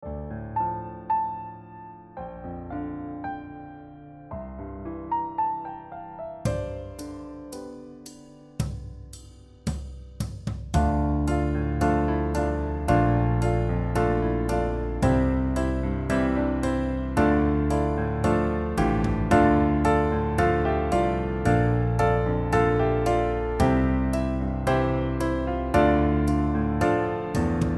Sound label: Backing Track; an improvisation study for multi-level piano ensemble